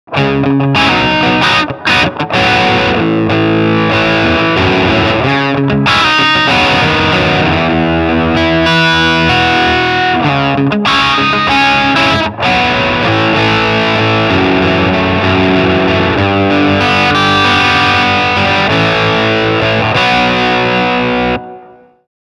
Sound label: Crunch Channel